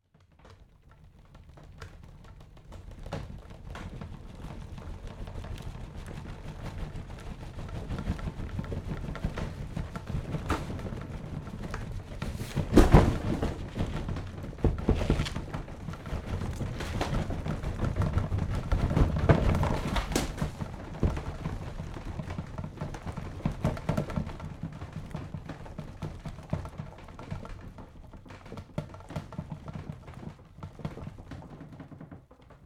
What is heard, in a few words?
household sideboard wooden shake rattle earthquake items fall to floor